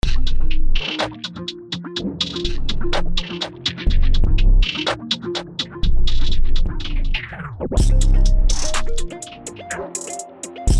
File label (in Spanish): pista 127 bpm